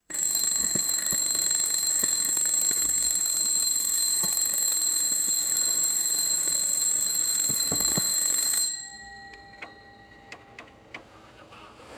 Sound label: distant mechanical alarm clock ringing; alarms